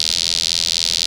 PERC; ZERO-G; Datafile

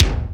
R8 BD

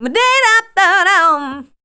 RHY LINE, Voice Spectral; RHYTM

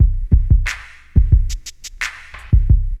LOOP, DRUM; E-Lab; X Static Goldmine 2

